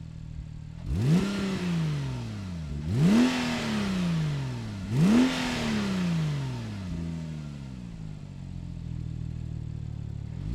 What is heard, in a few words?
911 turbo start; techart; gtspirit